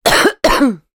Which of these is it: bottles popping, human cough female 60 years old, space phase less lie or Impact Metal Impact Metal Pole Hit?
human cough female 60 years old